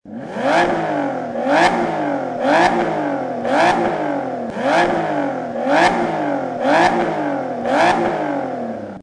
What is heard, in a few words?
vanquish revs